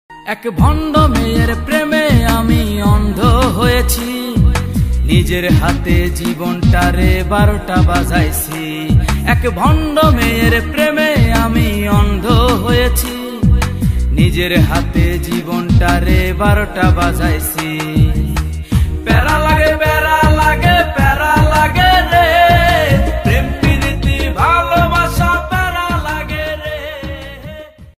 Bangla Song Ringtone

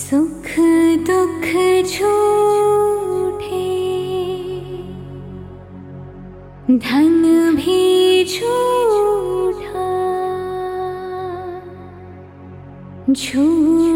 Hindi Ringtone

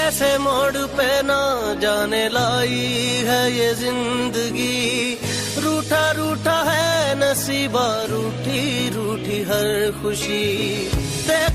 Sad Song

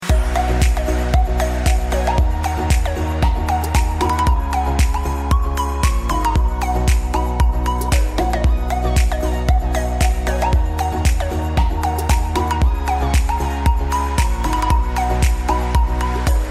iPhone Ringtone; ringtones

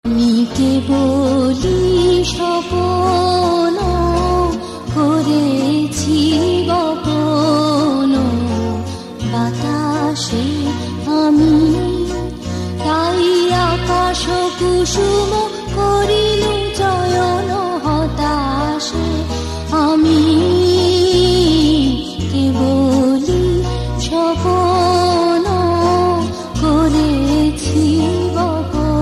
Rabindra Sangeet Female Ringtone; ringtones

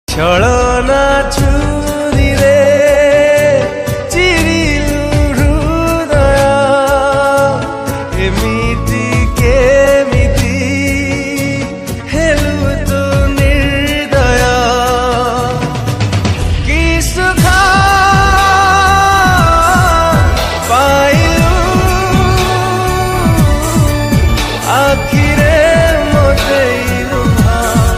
Odia Sad Song Ringtone

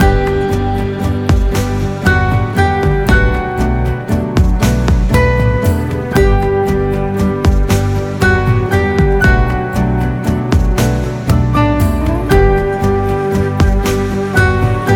Sad Hindi Song Instrumental Ringtone; ringtones

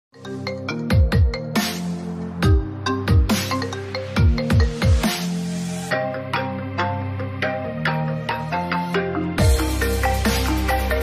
Apple iPhone 8 Original Ringtone; ringtones

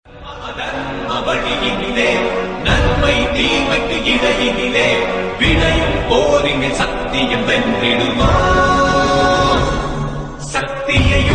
Bgm Tamil Ringtone; Mahabharatham

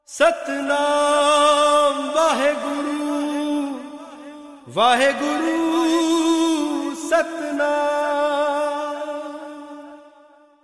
Gurbani Ringtones

